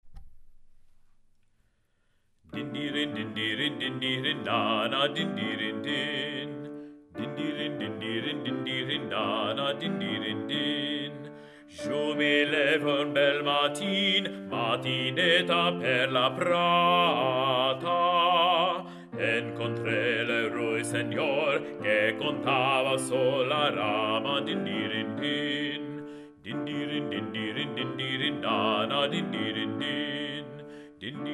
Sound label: Bass; Dindirindin, Anon.